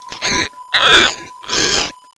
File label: child attack